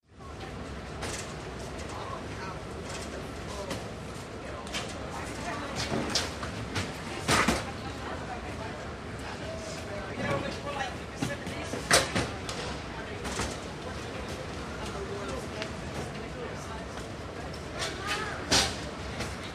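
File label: Baggage Claim Carousel, airport; Baggage claim carousel, busy ( Terminal 4, Heathrow, London )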